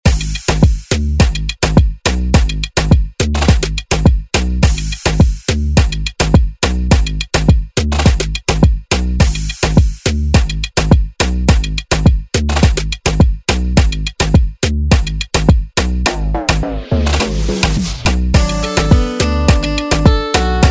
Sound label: Moombahton Redrum